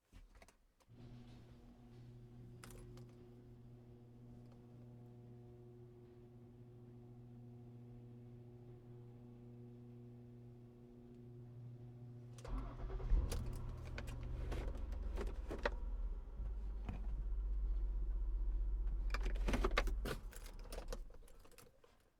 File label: car start garage opening